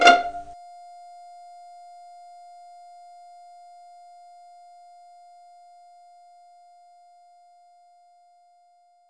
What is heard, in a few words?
Pizz Strings F5; knights try